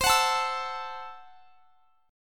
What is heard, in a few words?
C 6th Flat 5th C down; C6b5 strummed